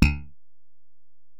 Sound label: Bell, Alesis DM-PRO